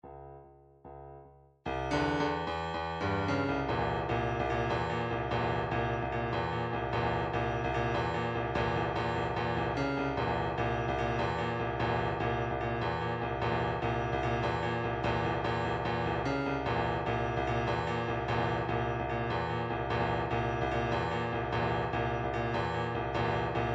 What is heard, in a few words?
drumtrax alternative 148